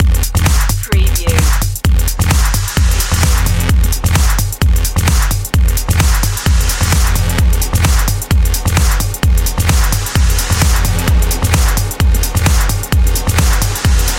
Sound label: gimme a beat loop